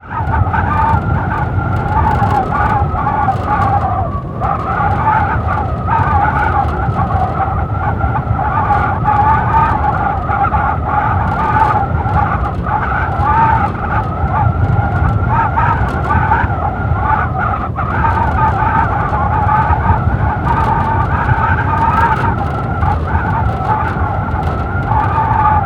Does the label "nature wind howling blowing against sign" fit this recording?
Yes